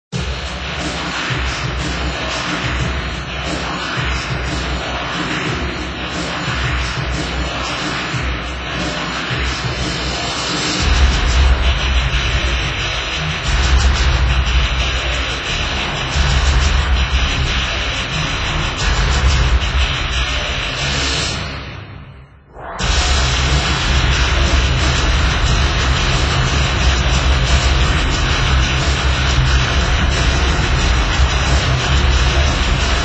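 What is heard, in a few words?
Music Ambient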